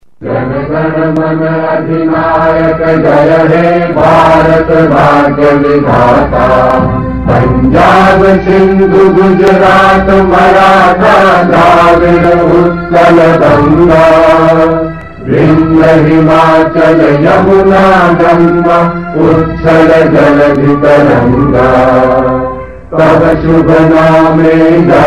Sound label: Indian National Anthem Jana gana mana